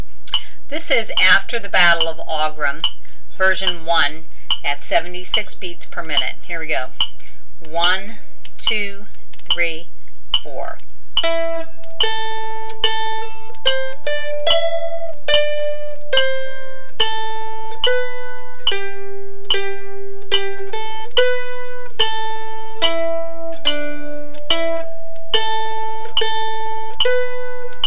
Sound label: 76bpm, mandolin